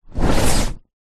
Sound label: boat rubber dinghy small drag pull rub hard ground